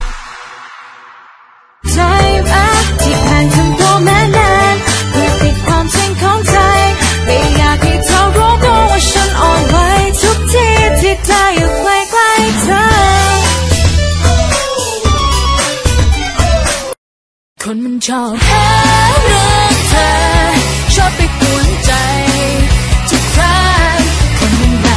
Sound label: thailand songs ringtone